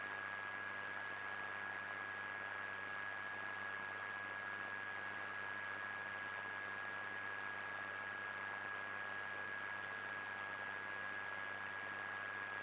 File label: Filter in, QRSS BPF